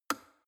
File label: plastic water boiler turn switch off hard